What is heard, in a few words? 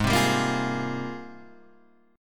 G sharp Major 13th, Guitar, Standard; 4,3,5,3,6,4; strummed; capo=3